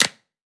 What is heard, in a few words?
clap, hip-hop drum kit; 99Sounds x Monosounds; Moon